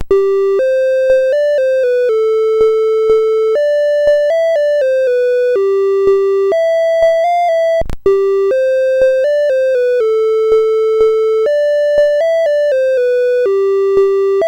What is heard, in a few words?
Merry Christmas BASIC, CSG8580R5